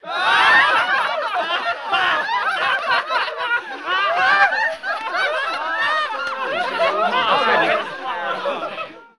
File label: Medium Crowd Loud Laugh; Sound Ideas